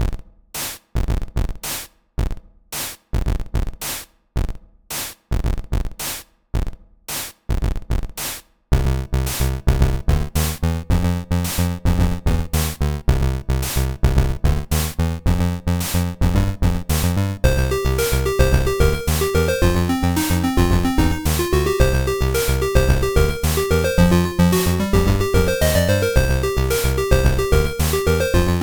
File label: mus menu